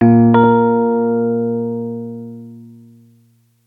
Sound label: E Ping Sound